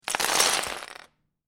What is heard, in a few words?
Lego Several Pieces Drop On Floor; Miscellaneous Toy Sound Effects; Lynne Music